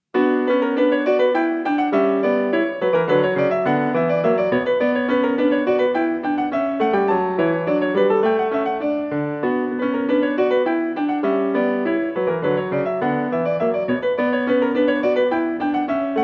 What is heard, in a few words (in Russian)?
Бах Французская сюита № 3 h-moll V Anglaise; Глен Гульд